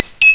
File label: Bleep; Mathter Mind